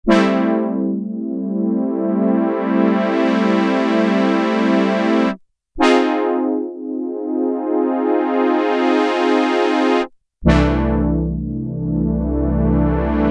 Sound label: Korg Poly-800, Double Crescendo Bass